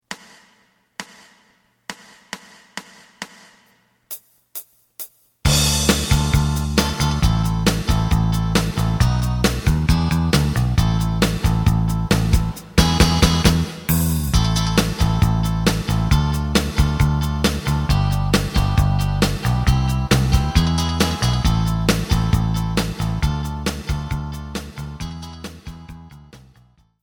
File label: lead; エレキバンド用カラオケCD